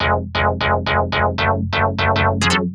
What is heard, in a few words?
Stab Loops; neuro; Optiv & BTK